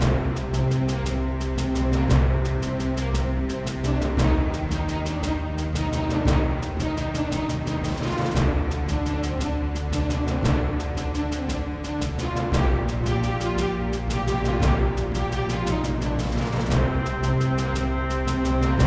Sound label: Main Menu Music Loop; Strategic War in Europe